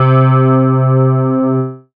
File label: pad, Dance Synths